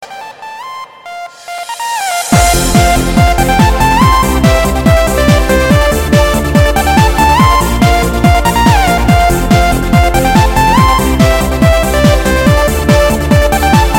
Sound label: heaven melody